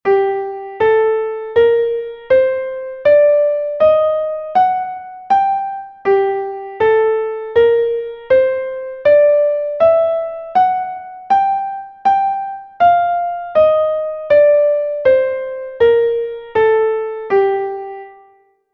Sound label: scale of g harmonic; Essentials of Music Theory: Elementary